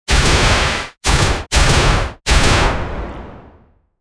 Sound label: high speed wind rush rapi; air